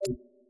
slide Out; game; zhipai

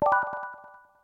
whistles; soccer; soccermove

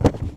pothole; game

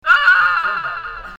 sound dead

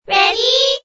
ready

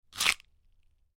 eat

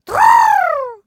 santa victory; game

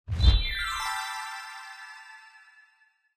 bonus show

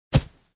step

